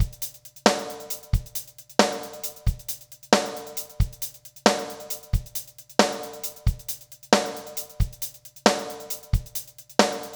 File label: 090 Pop Drums; Pop Rock; Moderate Tempo; Pro Tools Essential Loops; Digidesign